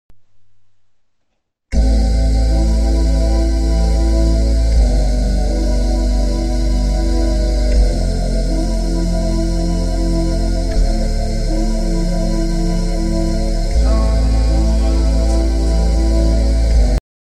beats

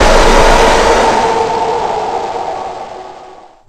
screamer

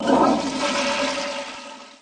toilet flushing

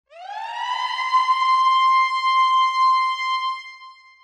weird siren

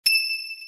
jewel drop sound; mu

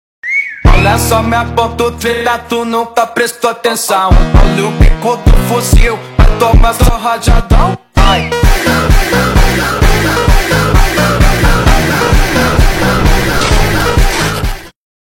snicker snicker phonk

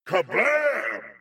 kablam; sunset overdrive

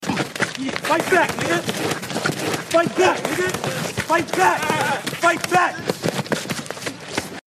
fight back kid sound effect

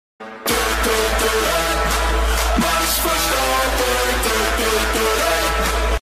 bass boosted

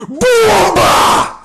bomba; papich

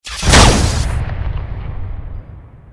pulse rifle; 40k tau

